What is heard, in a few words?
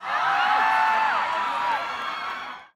Fans Screaming